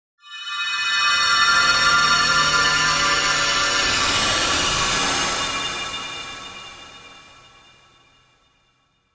Terror Screech, Scary metallic screech transition; Stingers; Sound Design

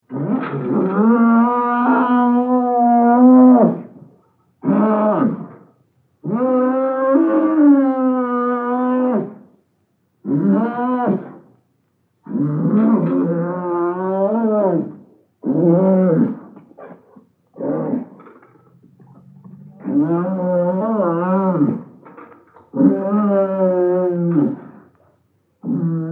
Bull Bellows Long; Cows; Animals; Cow Sound Effects

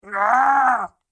Beast Gargle Snarl; Monster Sound Effects

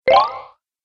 Boost Health, video game

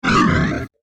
Electronica Whoosh; Whoosh Sound Effects

